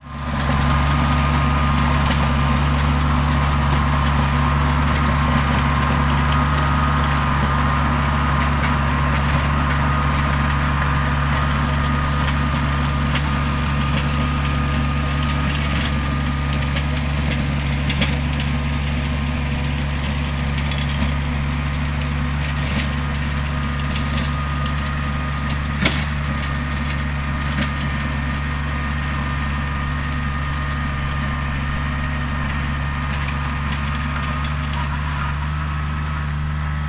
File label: industrial small cultivator tractor operating close by